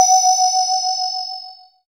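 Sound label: synth, MicroWave; Sound & Vision - Gigapack I CD 2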